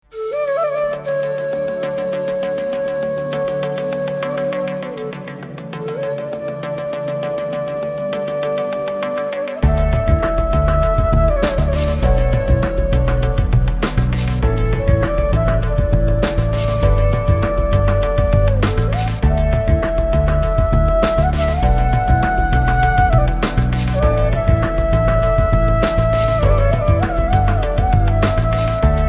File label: exotic bansuri; ZION MUSIC